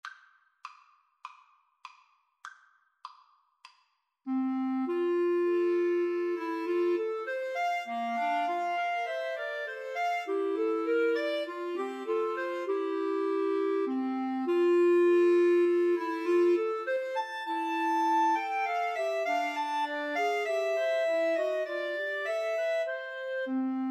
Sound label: Clarinet Trio